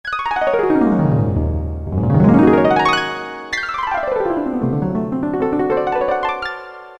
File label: Harp; Roland PN-D50-02